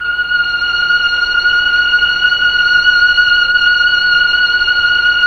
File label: STR, Melo.Strings, Slow Strings; Roland LCDP09 Keys of the 60s and 70s 1